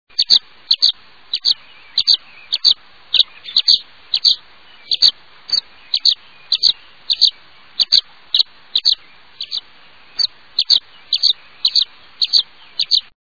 Violet green Swallow

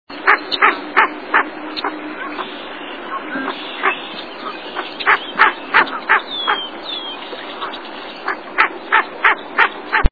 Ring necked Duck

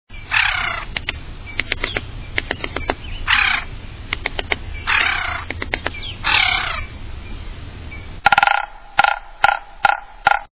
Williamsons Sapsucker, songs

